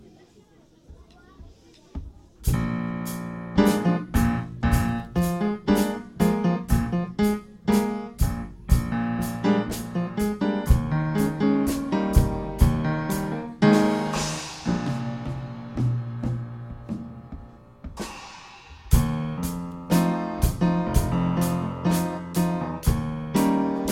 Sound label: Rock blues